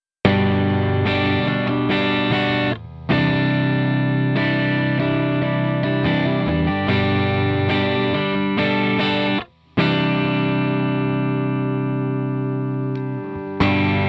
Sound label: Magneto UW-4300 Drive Neck Body Bridge